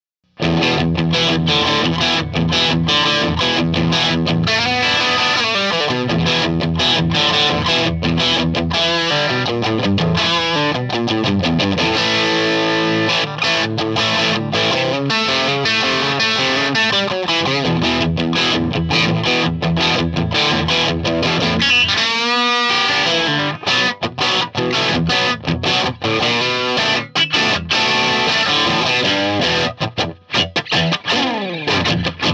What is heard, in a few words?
Strat Dirty; triwatt